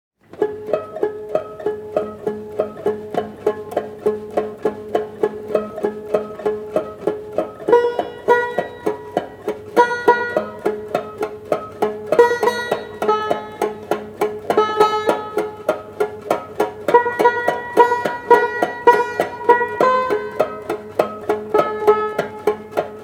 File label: Banjo